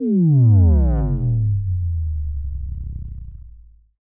Bass Drop; SoundsCrate

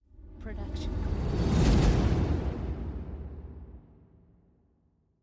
trailer riser; soundscrate

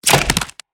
monster chomp; soundscrate

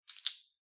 pen click; soundscrate

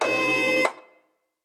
robotic arm; soundscrate

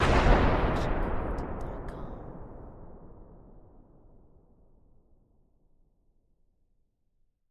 Explosion Blast; SoundsCrate

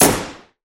Gun Shot

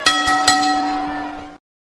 boxing bell

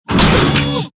Robotic Movement; SoundsCrate

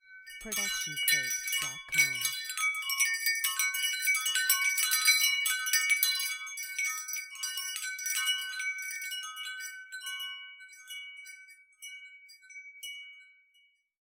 Wind Chimes A; SoundsCrate

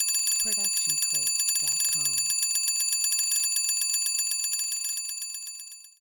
school bell; soundscrate

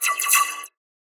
sci fi weapon blaster accent multiple; soundscrate

